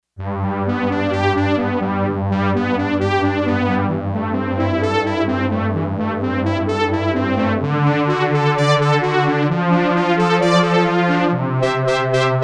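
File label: Analog-Strings, Yamaha TX802; Factory Patches; Voices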